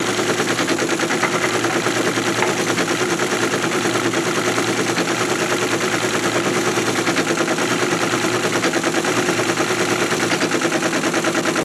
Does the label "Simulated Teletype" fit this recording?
Yes